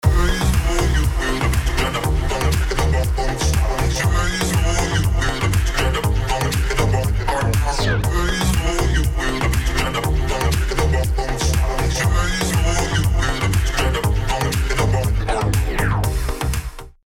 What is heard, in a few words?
Electronic Mixed